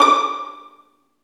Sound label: String Vlns Pizz